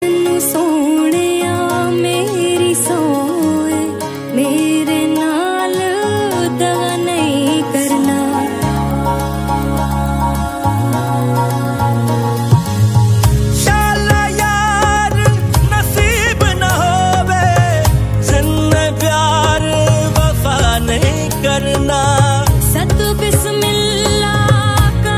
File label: emotional ringtone